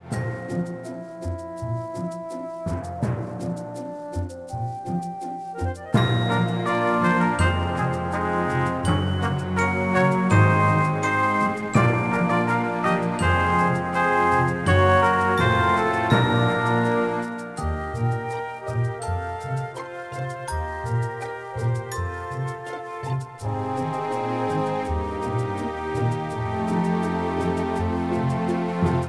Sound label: Musicloop; Pokémon Masters Arena